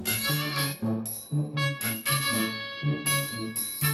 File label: funny